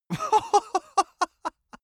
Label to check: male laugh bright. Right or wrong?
Right